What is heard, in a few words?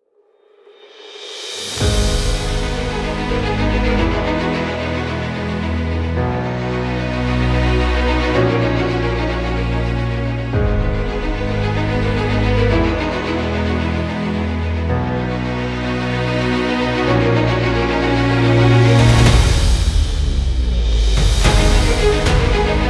Epic Trailer